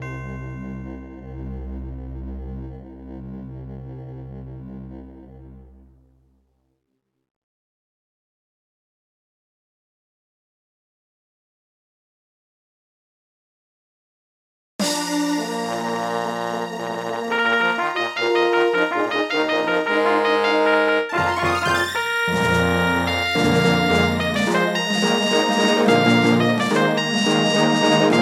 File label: Intro, Alesis Harmony 32; Dark Forces